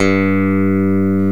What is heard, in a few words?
FACTOR PIC G, bass; Greytsounds